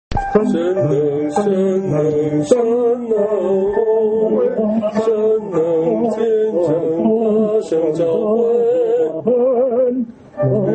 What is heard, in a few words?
hymn; worship